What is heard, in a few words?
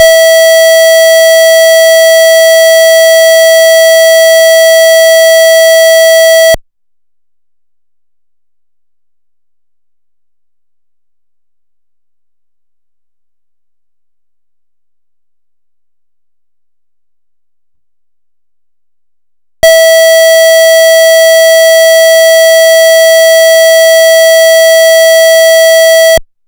Interrupted Sweden, Sweden SS031711 (important message (pre-mess); Interrupted tone; pfannenberg; signaling-technology; combined-signaling